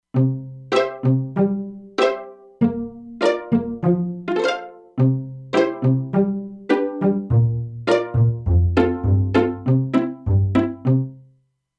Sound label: Pizzicato, E-mu Proteus-2; Factory Presets